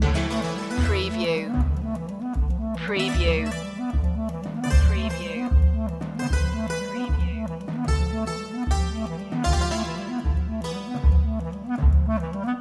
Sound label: loop